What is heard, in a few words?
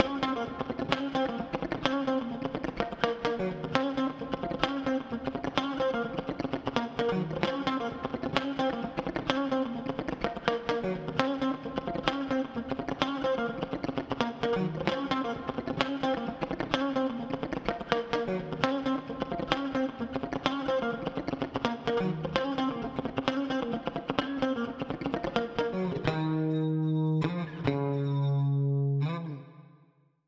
PIGEON FUNK